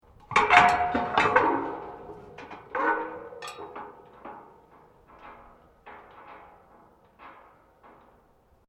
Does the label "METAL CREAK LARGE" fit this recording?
Yes